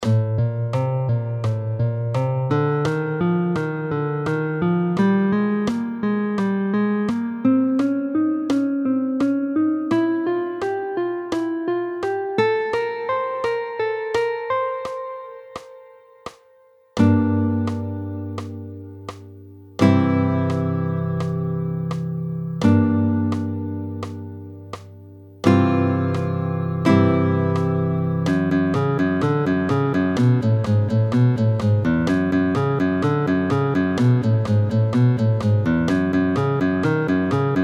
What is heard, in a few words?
Guitar Workout; Intermediate